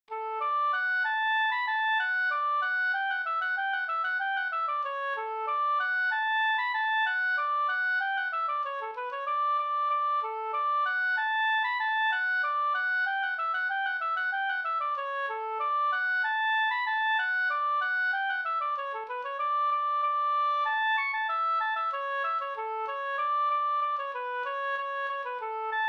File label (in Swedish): folkdans Hamburg Sextur